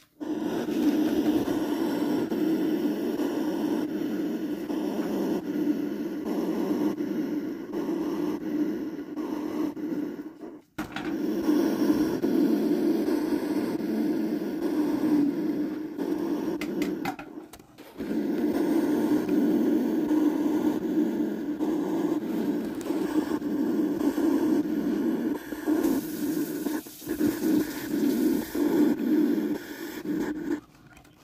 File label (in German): Braunigel Drohgeraeusche; natur erleben am bodensee; Natur im Wandel